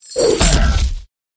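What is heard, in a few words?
flying armor attack bottom; letaushii dospeh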